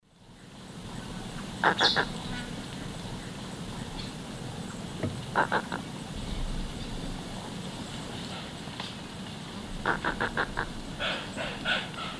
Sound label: Ajaia ajaja, calls; S. America; Eastern Lowlands